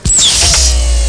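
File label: connect down; mtm2trial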